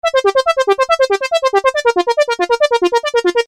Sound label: Arpeggio 140 C, Techno Synth, Arp140bpm; Loops; Sonic Foundry (Sony Creative Software); Techno Synth Loops 1 Signals I